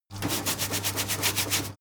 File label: mechanic sink running water brush